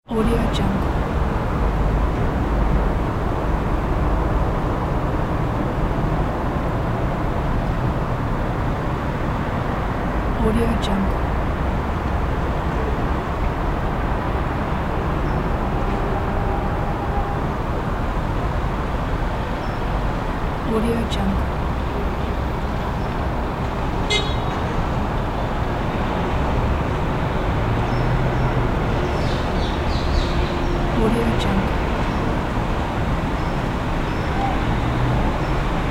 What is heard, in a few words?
city noise; soundfxpro